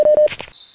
medic kit sound; half-life

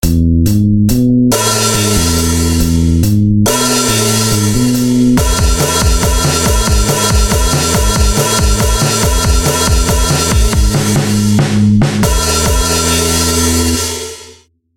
bass vs guitar

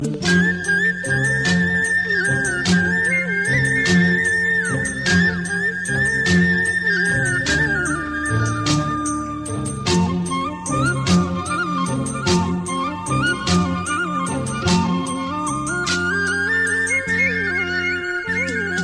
sweet romantic hit

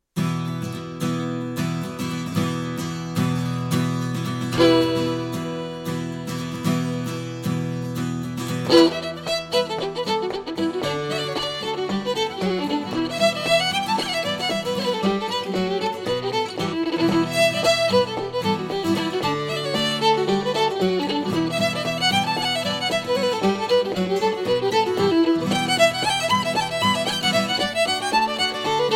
irish reel

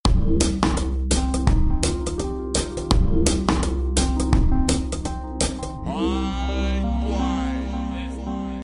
african vibes

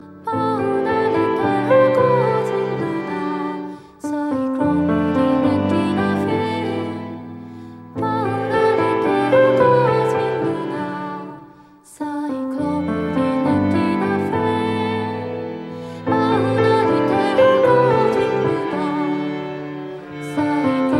dijurido; cowboy bebop

